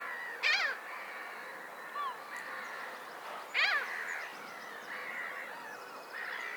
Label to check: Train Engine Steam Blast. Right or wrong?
Wrong